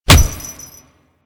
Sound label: Punch Impact; Royal Jester; Attack